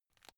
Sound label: plastic finger release